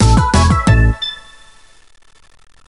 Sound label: Castle Win; Magnetic Joe; DSiWare; NDS